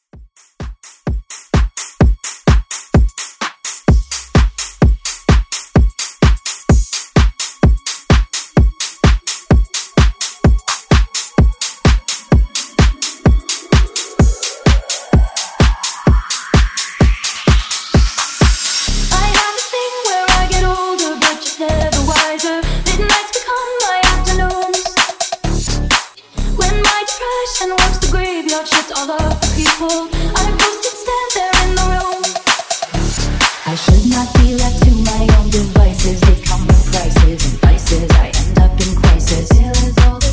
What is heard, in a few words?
Top40 Tech House Mashup